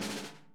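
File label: snare buzz; Sonic Reality; Interactive Drum Kits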